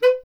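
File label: wind bass clarinet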